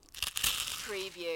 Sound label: BITING INTO FLESH